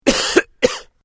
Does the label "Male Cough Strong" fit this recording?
Yes